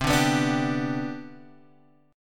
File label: C Major 7th Suspended 2nd C down Guitar Open G, chord